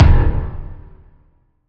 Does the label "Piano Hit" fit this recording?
Yes